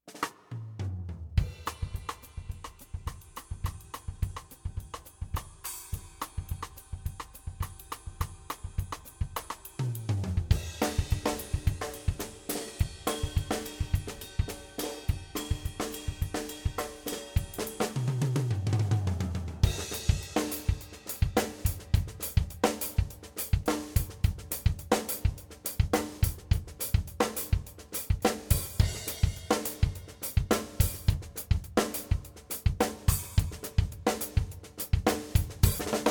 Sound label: Drum Freestyle